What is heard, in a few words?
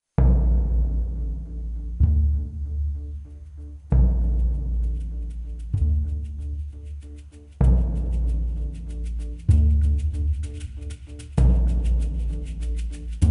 tribal; dragon riders chronicles of pern 2001 dreamcast gamerip